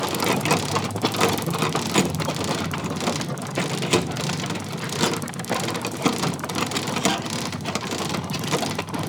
Rope Winch Spool Loop; Puzzles

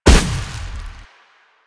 Updated Shot, Weapons; Warframe; Moa Pet; Cryotra

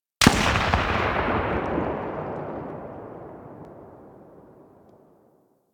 Sniper Rifle Tail; Warframe; Tenno

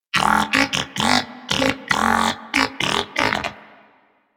Moa Pet Skip Rope; Warframe; Tenacious

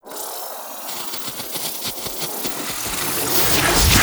Decoy Blast Warning; Powers; Fey; Saboteur

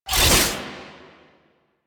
Whoosh, Warfan; Warframe; Tenno; Broken Frame; Quassus Prime

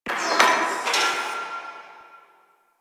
Gas Machine; Ambience; Warframe; Corpus Gas City

